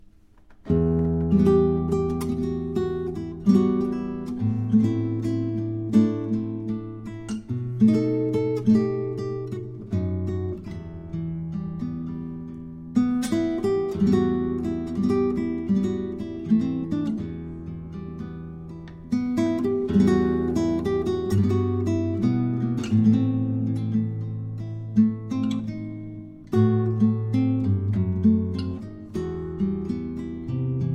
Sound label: Solo Guitar